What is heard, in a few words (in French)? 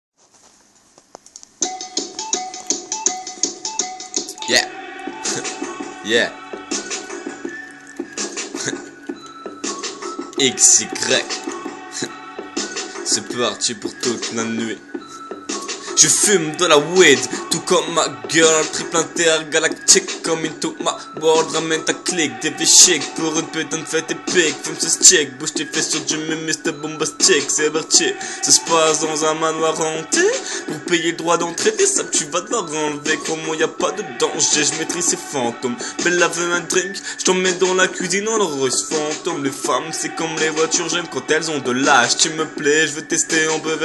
vocal